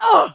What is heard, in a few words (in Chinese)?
女受伤; renlei